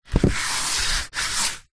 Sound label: household pool noodle float tube scrape on hard ground